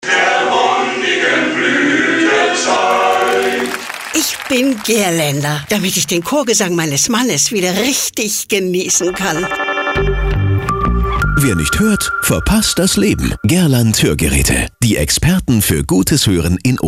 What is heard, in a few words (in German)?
Chorgesang; Gerland